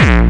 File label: hectech kick; Kicks; single hits